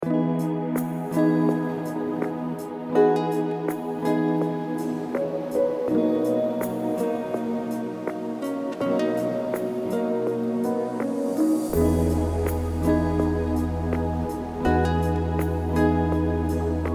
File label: Greek Isle Groove, luxury ambient